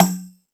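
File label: Jungle Percussion, Drum Machines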